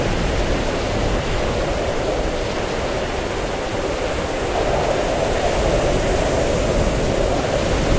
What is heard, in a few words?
ambience snow storm; everness